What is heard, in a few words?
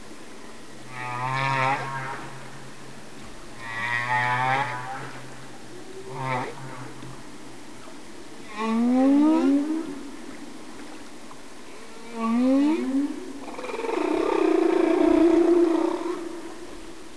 HW song